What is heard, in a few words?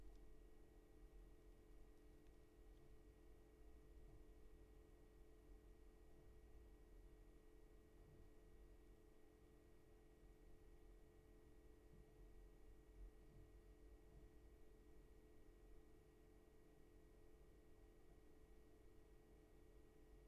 room tone